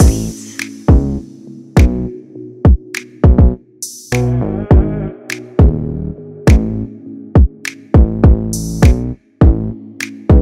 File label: English Movie Ringtone